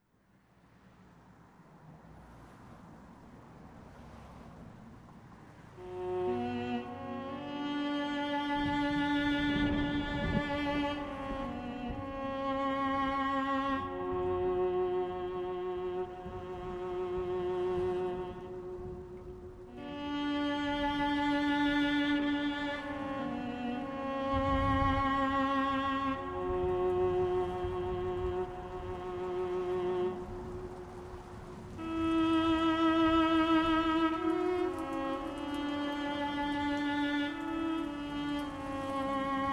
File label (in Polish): pozytywka 432 hz